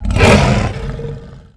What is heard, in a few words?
rancor hit; Star Wars Knights Of The Old Republic I; XBOX